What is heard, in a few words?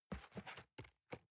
cardboard box open unfold; objekte